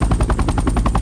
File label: helicopter move loop; Fieldrunners